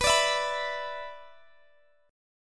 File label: B-Minor Double Flat 5th, Guitar Open D